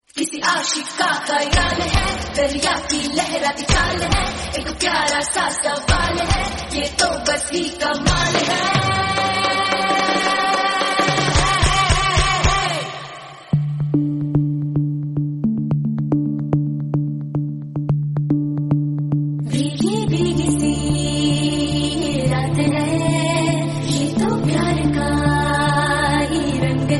rocking music tone ringtone

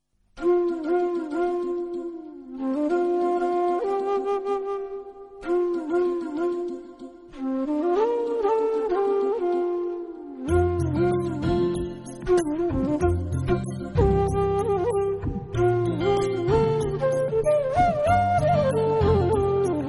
beautiful flute romantic musical tone; samsung ringtones

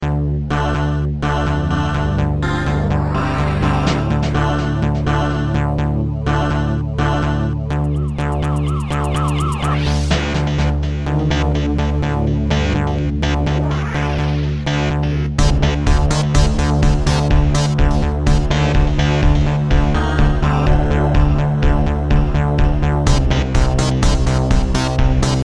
piano station keygen music ringtone

